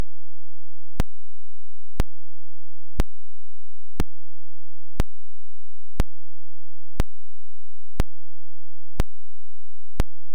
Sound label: pulse point5 hertz